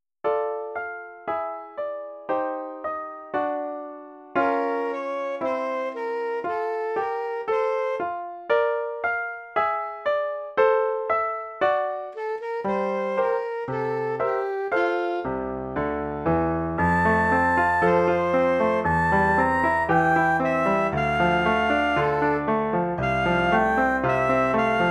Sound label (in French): Un Amour De Sax; saxophone sib